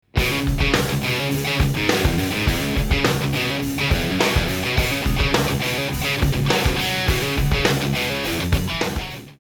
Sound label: HROCK